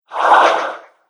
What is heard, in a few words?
Swoosh Whoosh